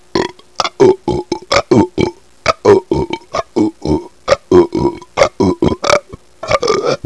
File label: rap burp